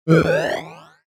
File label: Multimedia Internet CD Rom Flash Blurp